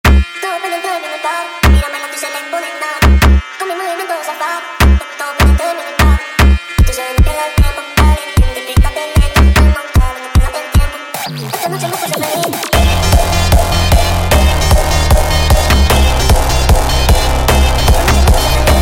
hardstyle Remix sped Up; آهنگ فانک

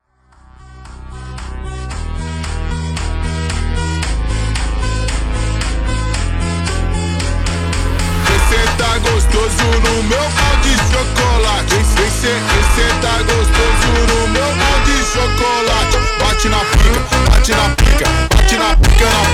slowed; آهنگ فانک

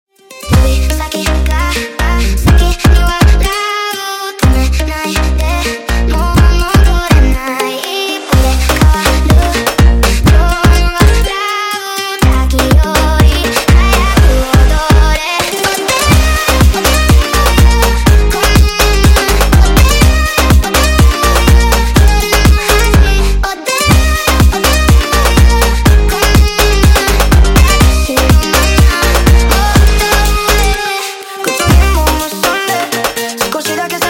sped Up, funk

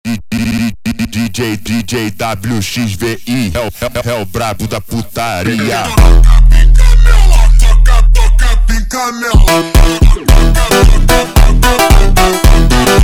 slowed, funk

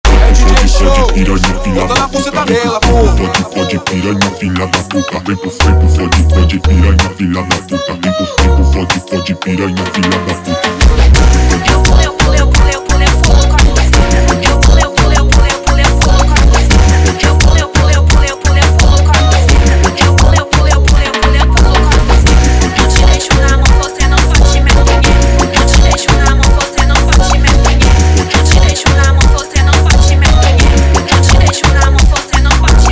Brega slowed, funk

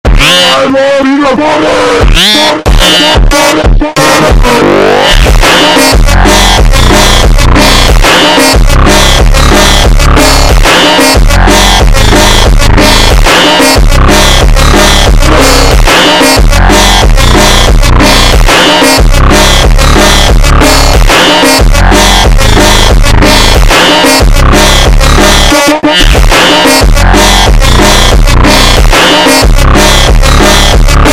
ultra Slowed

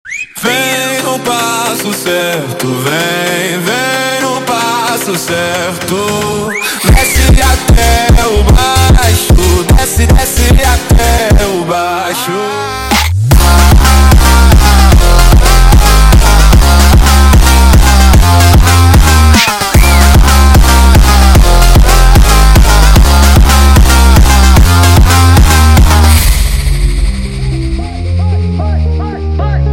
sped Up, فانک

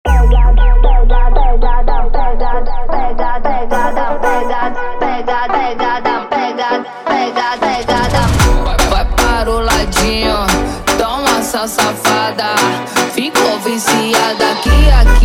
funk

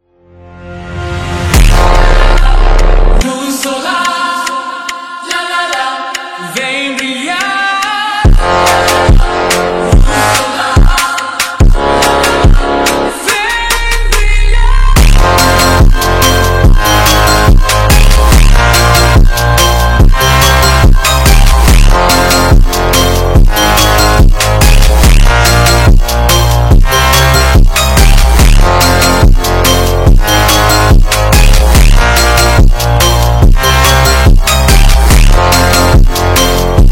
Funk, Slowed

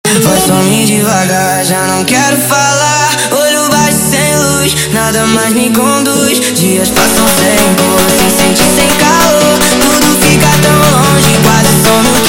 Montagem Voz Devagar sped Up, funk

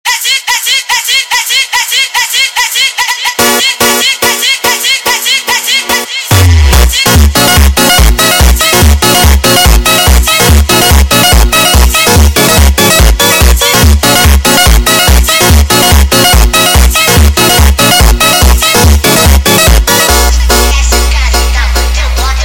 sped Up, funk

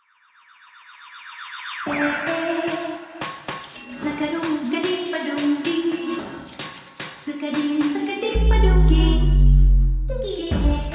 Automotivo 8d

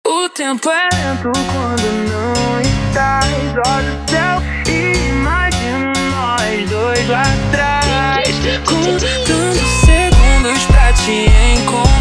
Montagem super Slowed, funk

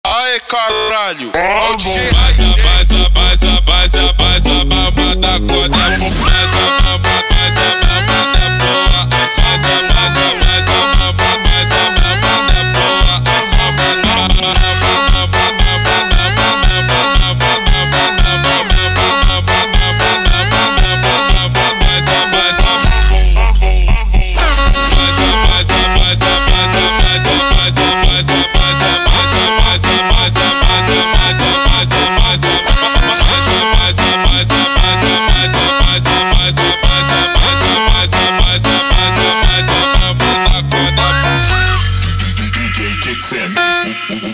Funk slowed

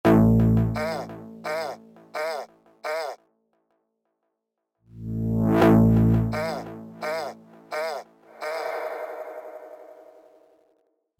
Super Slowed

